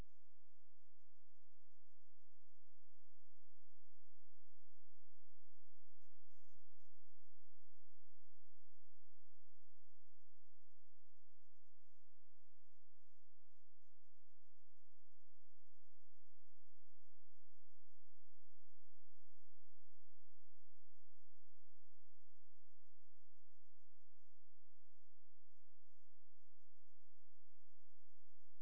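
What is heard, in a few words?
hydrophone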